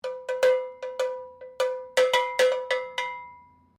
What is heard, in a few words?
HORSE BELL FORGED RINGING; Various old or antique devices; Bells; Musical